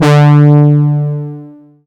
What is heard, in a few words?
SYN, Analog; Sound & Vision; Gigapack I CD 2